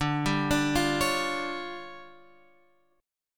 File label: D-Minor Major 7th Double Flat 5th down Guitar Open F, DmM7bb5 chord; arpeggiated; JGuitar